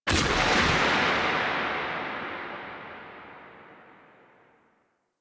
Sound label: Arrow; Ivara; Warframe; Vitruvian Museum; Warframe Powers; Leverian